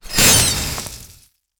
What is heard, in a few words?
high speed ice spear magi, projectile